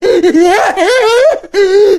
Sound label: jockey lurk; Left 4 Dead 2